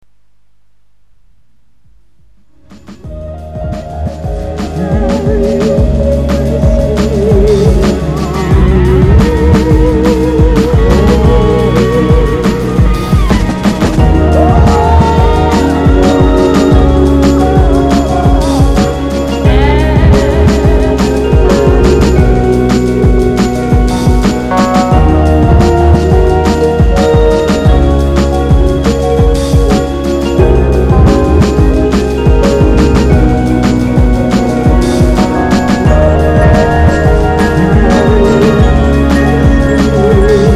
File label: blues